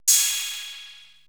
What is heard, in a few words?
drum, 80s Drum Set, DRUMCOMPUTER; Best Service ProSamples vol.55 - Retro Sampler